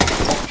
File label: player ladder